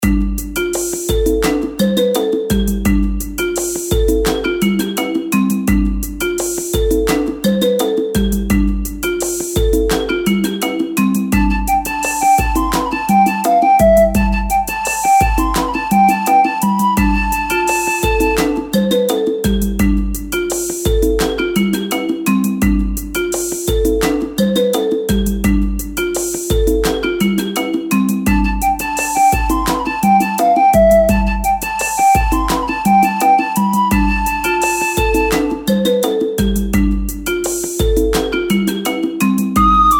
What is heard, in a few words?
folk; 南国：民族02; maoudamashii